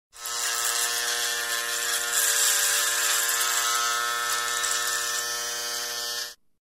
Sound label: Electric Shaver